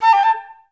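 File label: flutes A4; Best Service; Peter Siedlaczek's Advanced Orchestra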